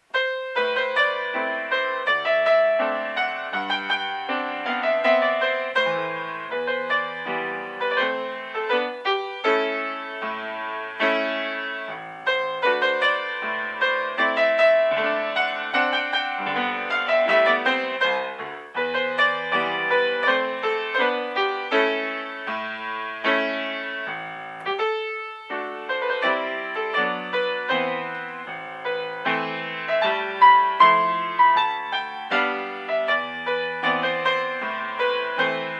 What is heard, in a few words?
rumanian folk, piano